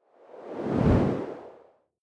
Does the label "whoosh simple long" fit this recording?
Yes